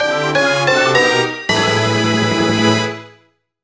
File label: Fanfare; "Get Force Gem" fanfare (Phantom Hourglass: "Get Sand of Hours")